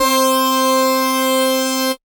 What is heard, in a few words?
Lead, Synth Lead; At Your Door Lead; Ableton; Factory Packs; Chop and Swing